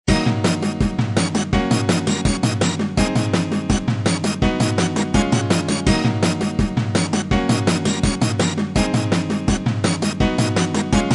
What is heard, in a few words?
pipeballad